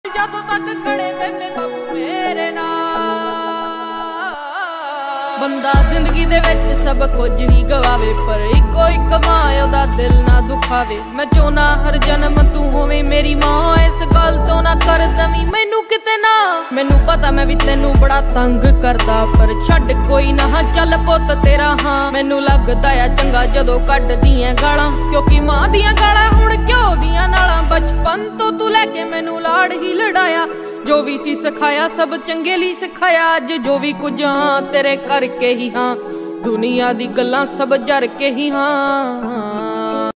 new trending Punjabi ringtone

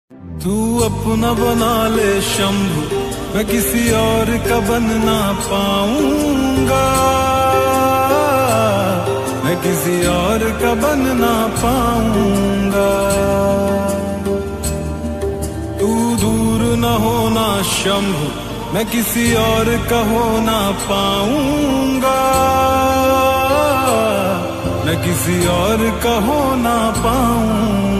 song ringtone

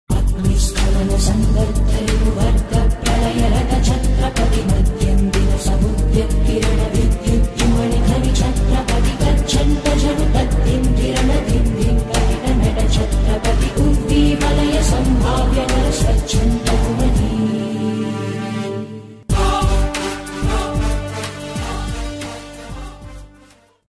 ringtone, call ringtone, bgm; chatrapati shivaji maharaj

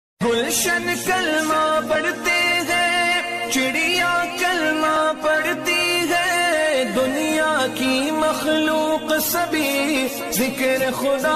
kalma naat ringtone

